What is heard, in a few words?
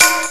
TAMHIT; Rubber Chicken Software Sample Bank; DRUMS + PERC